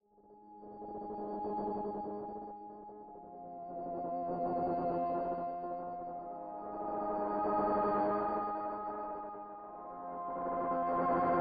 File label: Mech Noise Piano Background